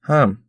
chorus anger

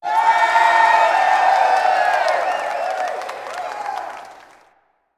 Miner Typhoon Cheer; battle suit aces

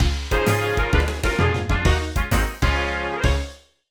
Card Game Jingle; Pokémon HeartGold SoulSilver Remastered 2022

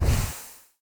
Hero Wings

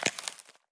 Cruch Bones

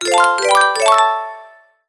Music Frontend

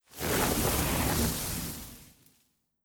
Satyr Conf Spell

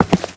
Footstep Walk

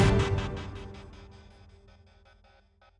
Battle

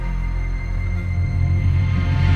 Battle

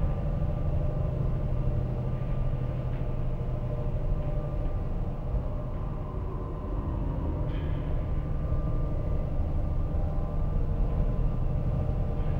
ambient; Soldier of Fortune Payback; PS3, Windows, Xbox 360; ukg

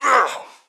Shield Damage; Pecheneg

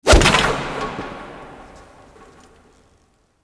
Sfx Basketball

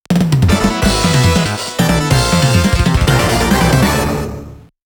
Stage Complete

